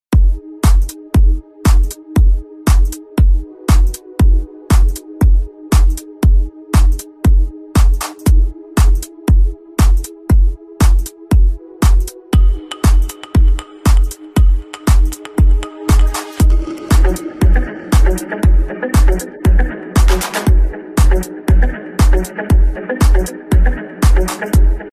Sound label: progressive ringtone